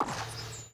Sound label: Plasma Hit